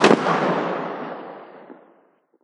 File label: mag7 distant; Counter Strike Model Pack; CS2